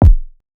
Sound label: Not Nice Kicks